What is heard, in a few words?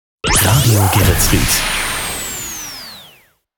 Jingles Shotgun; Radio Geretsried